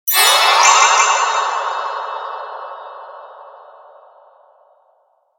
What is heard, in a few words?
Pixie Burst Soft Warble Delay, Twinkle Shine Shimmer Sparkle; Production Element Imaging Element Accent Transition; Blastwave